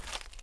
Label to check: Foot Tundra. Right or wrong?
Right